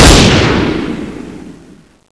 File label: fire; cso; x-15